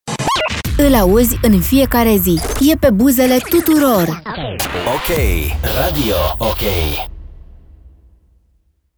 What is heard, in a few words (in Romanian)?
Jingle Generalist; Radio OK Statie de Distractie